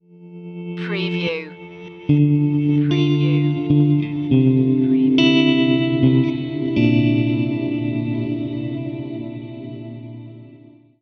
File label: slow down stinger